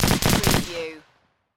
Machine Gun H K MP540 Short Burst Triple

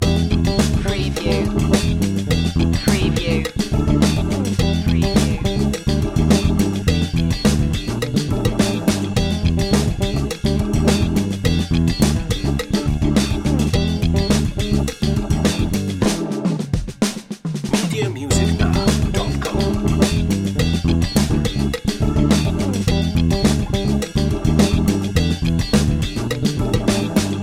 do it funky loop

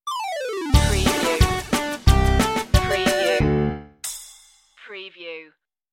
retro video game bubbles stinger

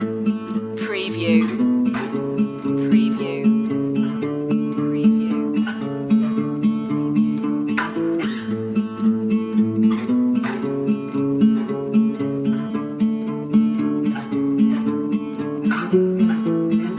walking home loop

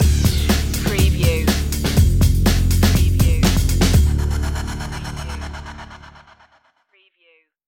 funky future stinger

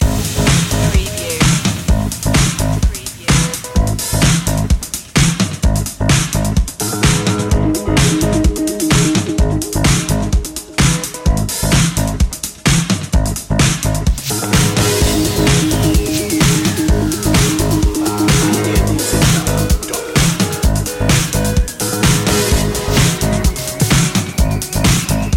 robo-disco

